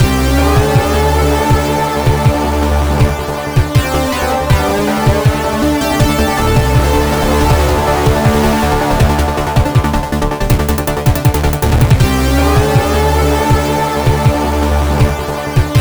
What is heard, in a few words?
Combat Intro; Space Tyrant